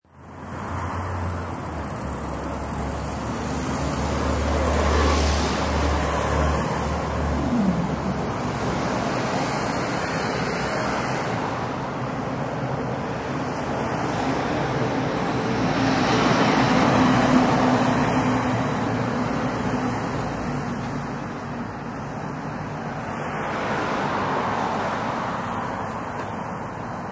Cars Traffic, Cars pass by at 30 mph.; Backgrounds; Traffic and Cars

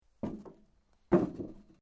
Metal Sheet Move; Materials

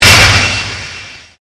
Convolution Pulse

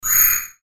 Swell Reverb Tonal; SPECIAL FX; Swell Sound Effects

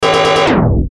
Descend Glitch Power Down; Descending Sound Effects; Blastwave

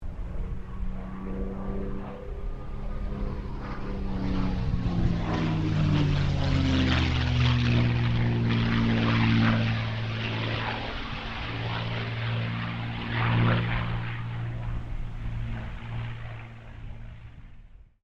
AIRCRAFT CA-18 MUSTANG PASS

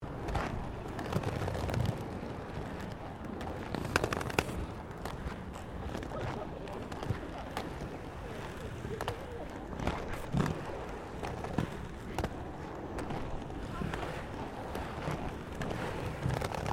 ROLLERBLADING PAVING MIXED MANEAUVERING; Sports

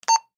Walkie Talkie Push Button Beep; Blastwave

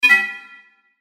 Chime Multimedia; Web Interactive; Chimes; Blastwave

